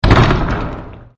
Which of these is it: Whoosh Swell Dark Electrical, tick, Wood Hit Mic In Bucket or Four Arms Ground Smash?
Four Arms Ground Smash